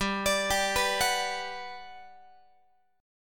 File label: G Major 7th, G, down, Guitar; Listen to GM7 arpeggiated; GM7 Chord